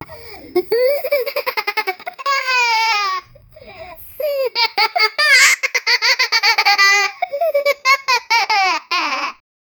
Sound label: child laughing with conti; happy